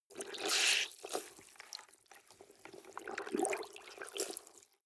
clothes water wring hard

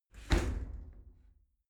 shower slide door close hit regular

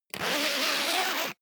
zipper pullover jacket open

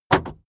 car remote lock unlock interior

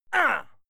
male fight ah; schreie; menschen; soundpool

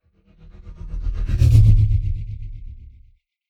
sci-fi vehicle drive by tonal flutter; raumschiff

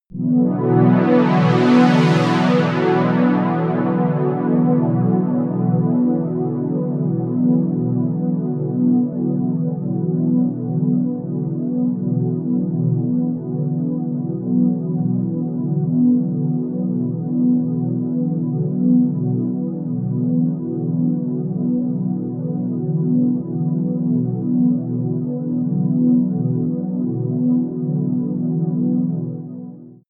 wavy clouds, music elements

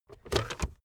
car backseat table fold in fast

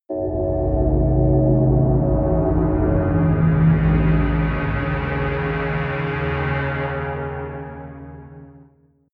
large hollow drone deep, drones

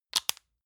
lotion lid close medium; friseur; berufe

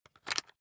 rock drill head attach heavy; maschinen; bohrer; soundpool

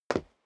step sneaker wood parquet single stair down; schritte

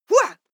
female fight attack shout short; schreie; menschen

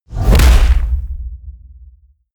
wham, whooshes

